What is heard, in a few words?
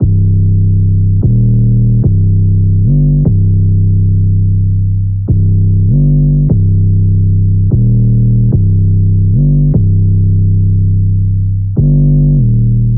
808 loop 148 Cm; Slate Digital BASSHOLE Sample Pack; 808 Loops